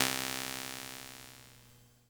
VOX C1, Vintage Keyboards; Big Fish Audio - First Call Vintage Keyboards

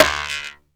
RAIL BUZZ, percussive; Rarefaction; Poke in The Ear With A Sharp Stick

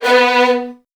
B1, VN 16TH, Virtuoso Strings; ILIO; Kirk Hunter

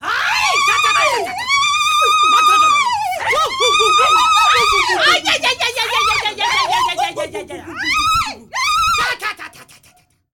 WILD GIRLS, AFRO NOISE, World Voices; ILIO; Vocal Planet VOL-5

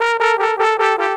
Trombone Effect, effected trombone

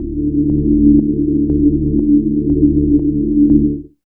DRONE; East West - Phat & Phunky

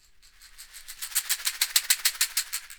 CAXIXI; ILIO; Synclavier Percussion 2; World & Orchestral

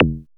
RES TONE; HIQ+ZAPS

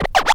X SCRA L, RECORD-FX; XP RECORD-FX; Best Service; XXL Production Effects

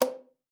KLPA C4, ALTOSAX; Xsample VOL-2

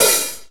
Acoustic Hat; Digital Sound Factory Xtreme Lead

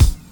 KIT, Dance Mega Drums, HIP

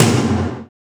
TOMS; DNC TOMS; PROCSTOM

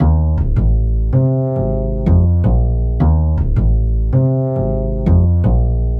Standup Bass, Drum N Bass; Sonic Foundry (Sony Creative Software); Knutrix Drum & Bass

